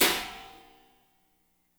CHINA, HI-HATS; Spectrasonics; Burning Grooves

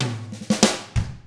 95 FUNK, Drums; ILIO; Double Platinum Drums 1

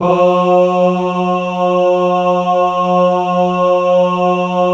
Synclavier Strings; ILIO

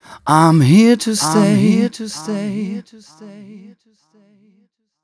m 129 mel e, Vocals

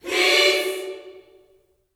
PEACE CHD, Vocal Planet Gospel GOSP CHORD

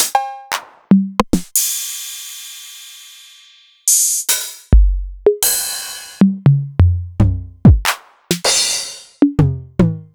Novation Drumstation

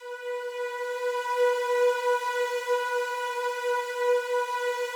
slow strings B3, Yamaha VP-1; 90 s Sample CDs; Music Store

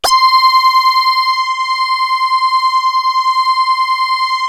SYN LEAD; MORPHEUS

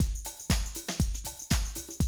120, Classic Drum Loops

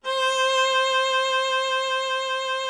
VIOLA C4; Greytsounds VOL-1; VIOLIN+VIOLA

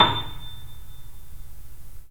3D Pianos Yamaha C7; E-MU Producer Series Vol. 5

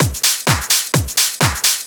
Discohouse Loop 128 BPM

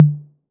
tom, Roland TR-808

